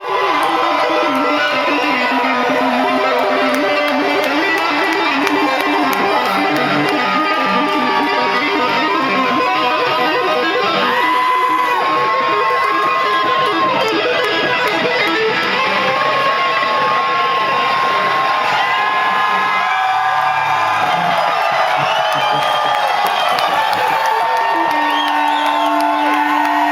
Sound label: Shred